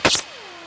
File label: gun element